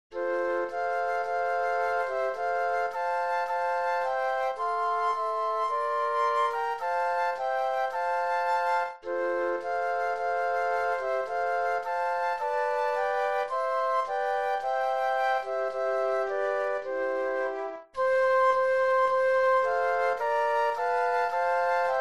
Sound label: Flute Trio; christmas